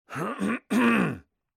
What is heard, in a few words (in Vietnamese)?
Tieng nguoi dan ong u hu de gay su chu y